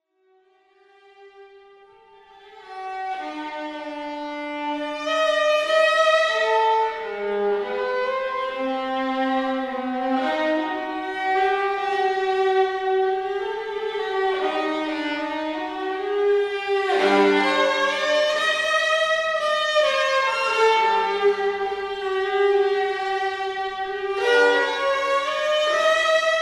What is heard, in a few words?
Viola Solo